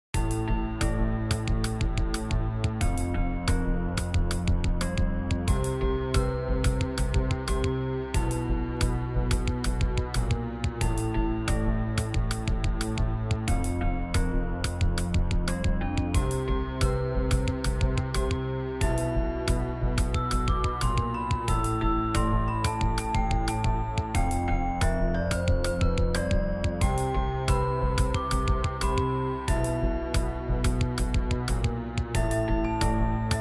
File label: lullabye jam